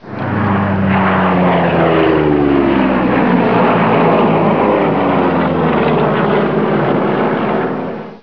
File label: P38 lightning